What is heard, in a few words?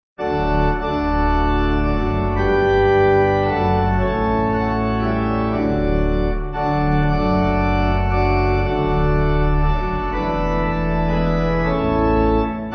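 Pipe Organ; Herongate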